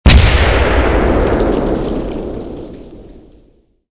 explosion

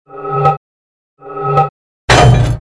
TOW reload

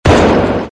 cannon25he

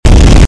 automatic cannon old

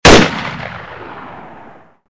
ak74 single

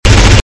automatic cannon

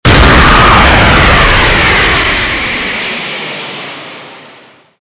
launch

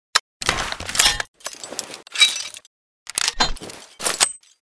at load

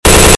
ak74 full, weapons

